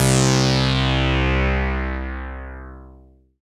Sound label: SYN ANASY, dance synth; Sound & Vision - Gigapack I CD 2 (Roland)